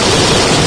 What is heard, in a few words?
rocket fly loop; video games; weapons; source engine; portal